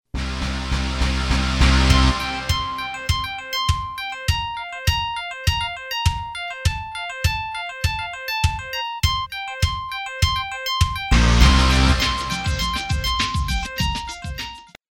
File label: musicbed sample, industrial